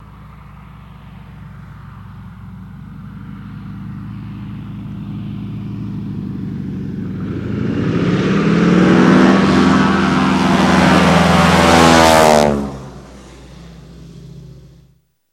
Prop Plane DHC, de Havilland Canada, Slow Steady, Rev, By Fast